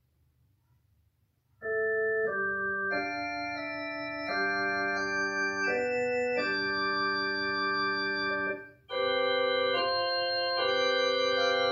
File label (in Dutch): improvisaties orgel